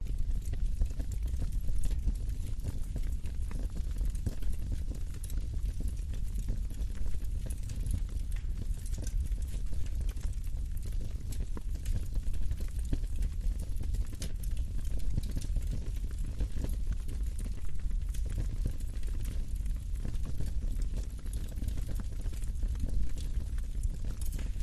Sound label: fire burning firepit; silverplatter audio